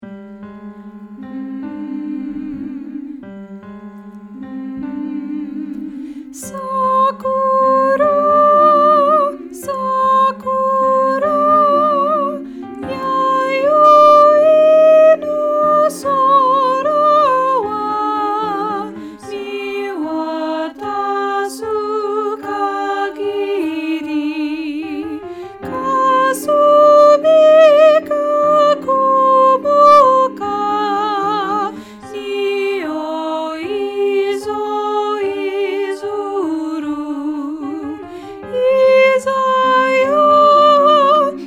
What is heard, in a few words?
Soprano; Sakura Sakura